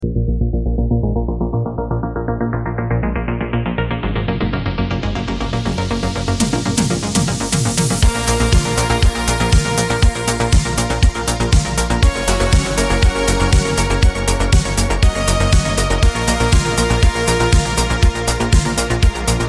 retro drive synthwave

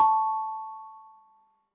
success ding sound

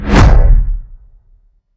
deep heavy whoosh

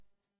clean ui click

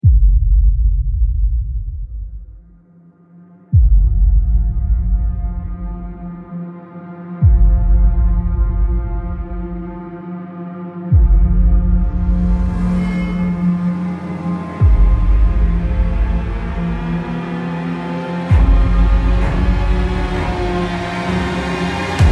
foreboding tension scape

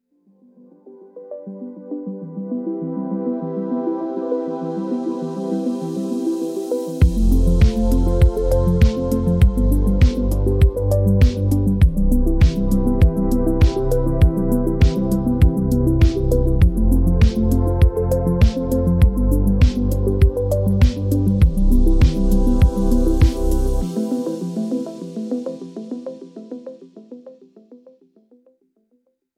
melancholic retro pulse

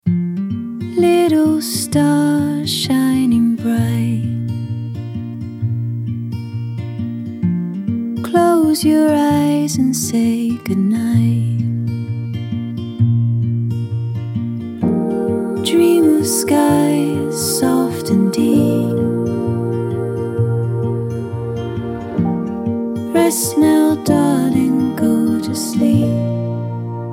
cozy fireside lullaby

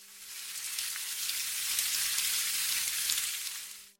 light rain leaves

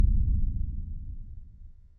distant muffled explosion

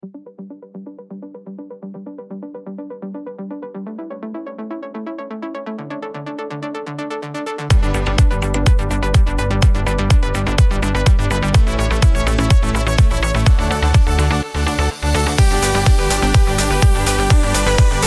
pulsating electro drive

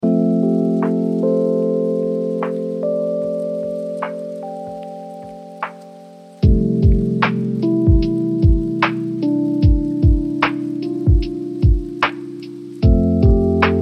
dusty bass reflections

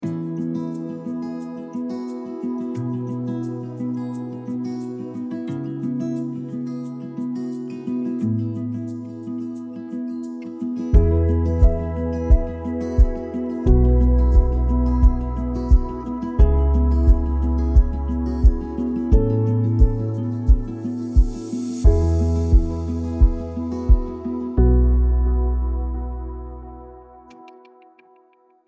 uplifting acoustic warmth

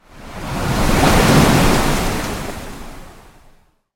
crashing ocean wave

